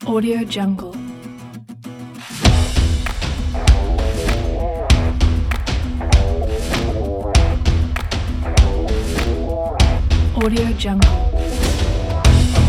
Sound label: stomp driving rock